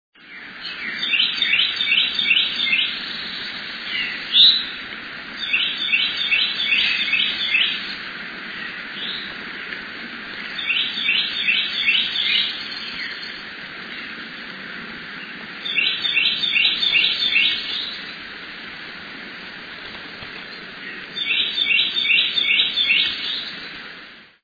pajaros al aire libre

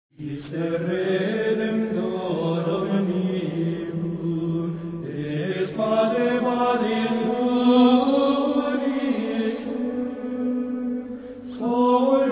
Christe Redemptor Coro monjes

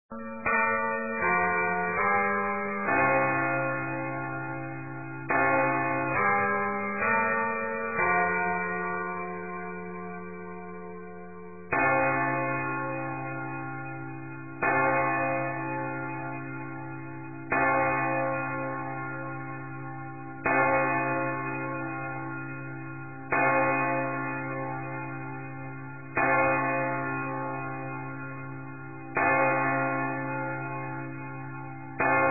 Doce Campanadas

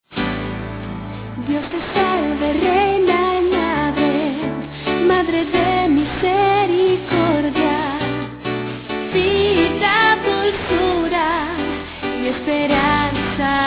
la salve cantada

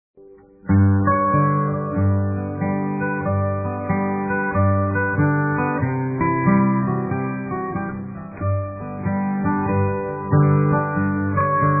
instrumental meditacion hawaiana

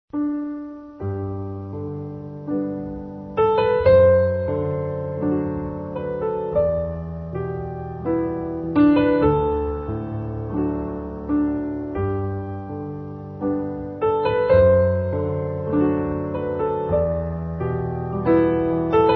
instrumental

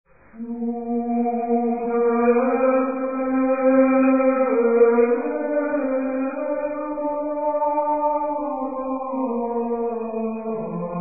aleluya canto gregoriano; Cantos Gregorianos

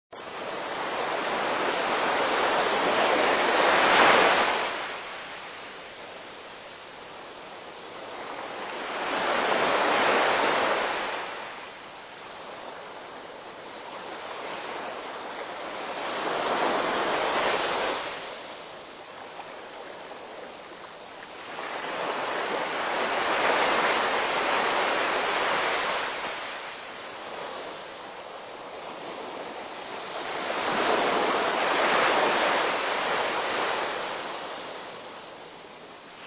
oceano

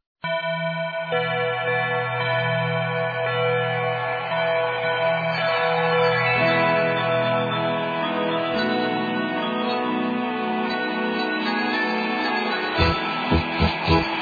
Campana sobre campana

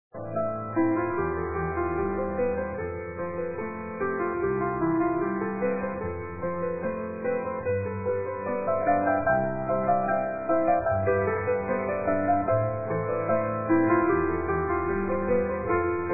notas instrumental

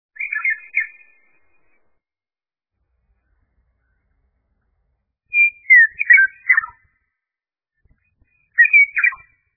piar de pajaritos

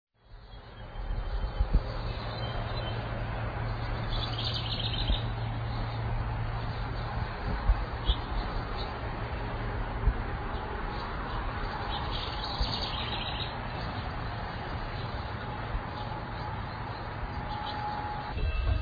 trinar de pajaros

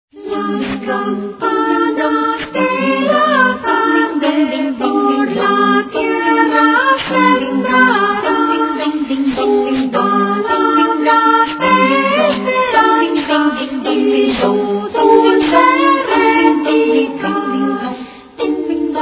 Carillon

